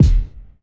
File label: subby bass drum D# key; Duration 0:01; Bitdepth 24; kick drum samples